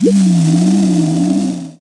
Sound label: balloon deploy